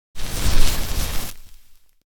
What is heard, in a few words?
Matches burning ignition sparking and crackling fire